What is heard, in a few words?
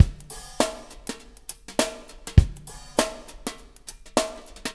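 DRM HH, breaks; Spectrasonics; Liquid Grooves 1 & 2; BR.SHUFF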